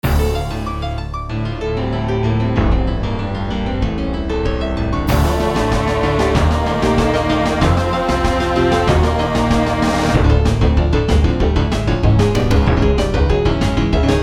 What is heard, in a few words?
piano music; final fight